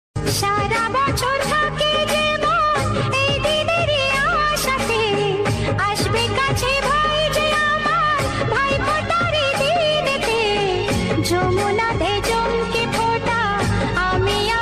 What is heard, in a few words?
bengali ringtone